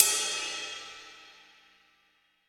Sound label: huge cymbal sound g key, Royality free single cymbal hit tuned to the G note.